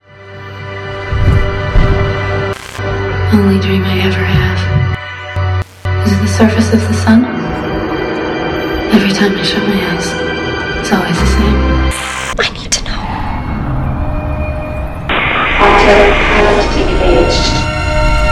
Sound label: music; spawngirlsounds